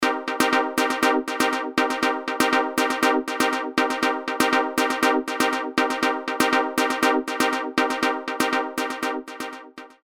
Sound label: Chordal Synth Pattern; Apple GarageBand 2; Apple Loops